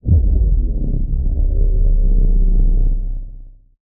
Low Machine Charge Down; SoundsCrate

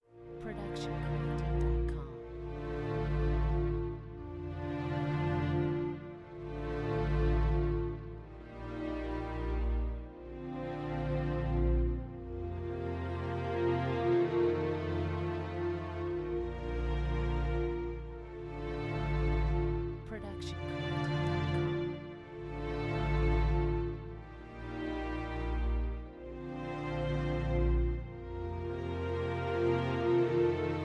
timid strings; soundscrate

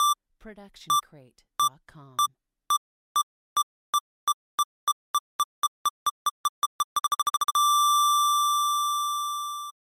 Bomb Beeps Increasing

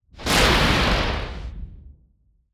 Explosion Blast; SoundsCrate

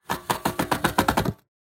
train going; soundscrate

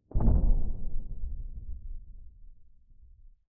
Sci-Fi Explosion Rumble; SoundsCrate

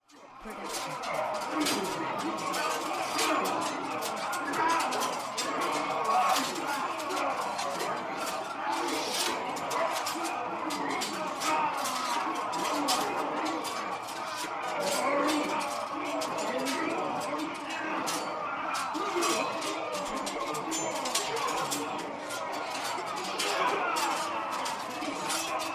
Medieval Army Battle; soundscrate

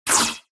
Anime Punch Synth Swirl

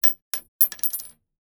Gun Shell Drop; soundscrate

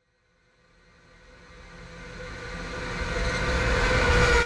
Ghostly Rise; SoundsCrate

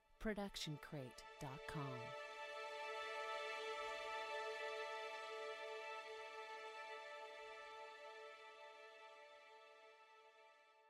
feel good strings cresc decresc; soundscrate

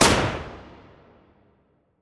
shotgun firing

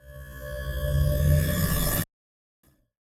Buzz Charge; SoundsCrate

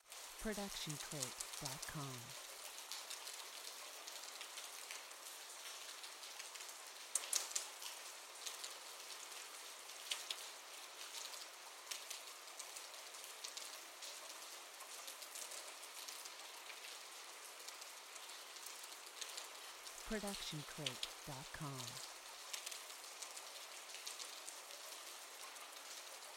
rain; soundscrate

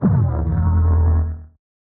Laser Charge Down; SoundsCrate

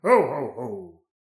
ho ho ho; soundscrate

